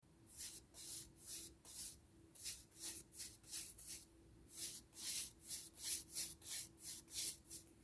ploskaja malenkaja kist po doske; Звуки кисти художника или маляра

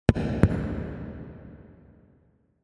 postukivanija v ogromnom pomeshhenii

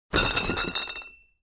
butylki zvenjat v jashhike

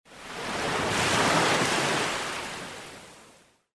smyvajushhaja

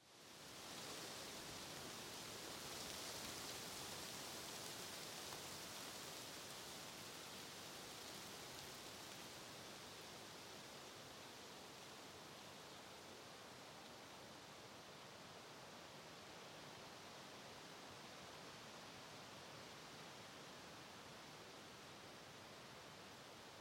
veter kolyshet listochki; Звук ветра в лесу; Звук ветра в листве

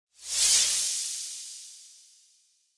Zvuk vzhuh dlia perehoda rastvorenia

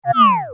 multjashnyj zoom out

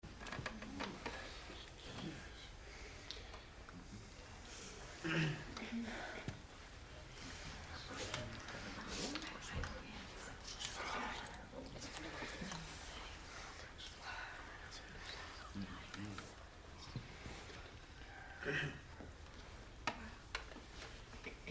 tishina v zale suda; Есть звуки суда

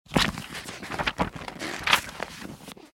listajut i trjasut knigu

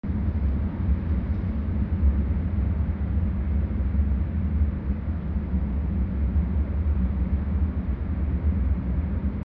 Nizkij zvuk vetra v pustyne

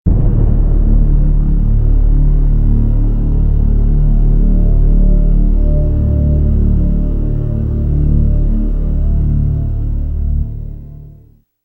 Nizkiy preduprezhdauchiy gul; Низкий гул; Звук саспенса

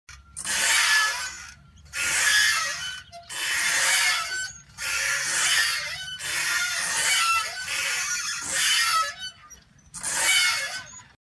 dve kacheli odnovremenno rzhavye cepnye; Звук качелей раскачивающихся на ветру, металлических, на цепях или веревочных

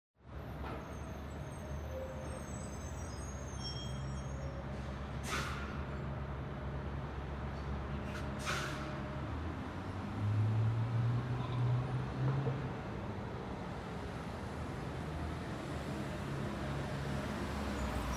mashiny v tihom gorode

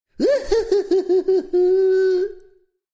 izdevatelskiy

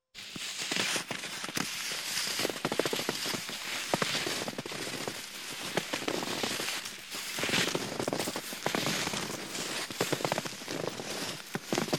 beg lyzhnika po rovnoj mestnosti po snegu

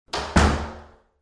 zakryvajut dver

